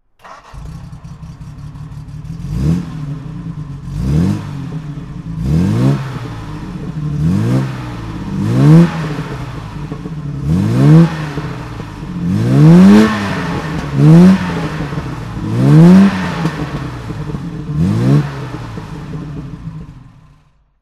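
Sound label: jaguar xk150 34L throttle, Engine sound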